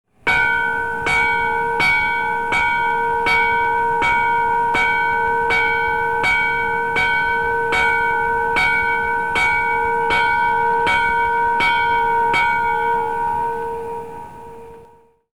bell

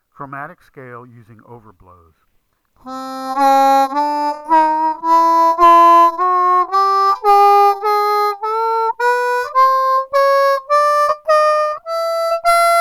Chromatic Scale

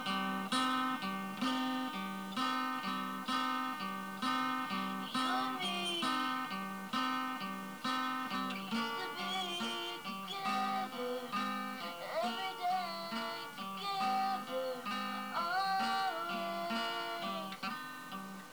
Accoustic

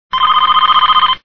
phone ring; scream

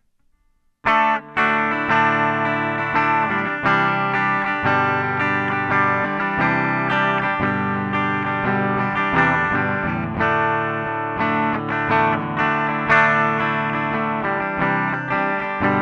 Waterfall Bubinga Cicada

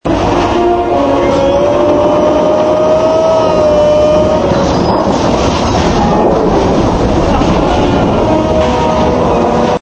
Aaah; spidey 2

